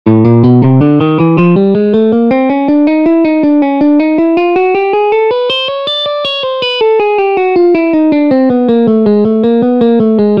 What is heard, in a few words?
guitar sound